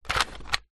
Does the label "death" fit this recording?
No